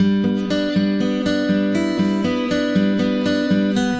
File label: acoustic guitar picking